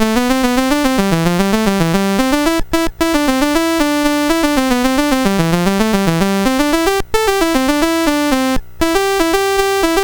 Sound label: Turkey in the Straw BASIC sid MOS6581R4